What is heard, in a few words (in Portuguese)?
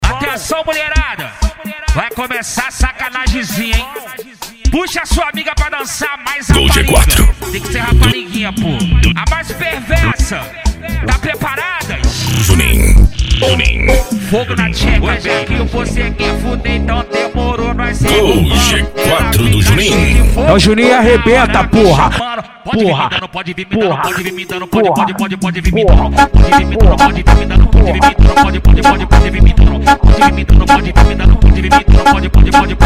cd funk automotivo